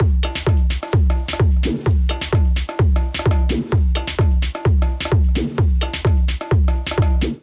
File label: Kit Melodic 129 bpm, Drums; MIDI Clips; Jive Kit; Ableton; Factory Packs; Skitter and Step